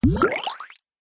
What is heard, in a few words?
slide